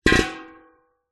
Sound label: industrial gas cannister metal down on concrete